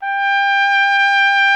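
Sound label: BRASS TRUMPET G 2; Roland LA; ORCHESTRA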